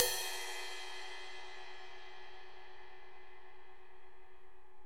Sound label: Ride, EMU PX-7 Drum Machines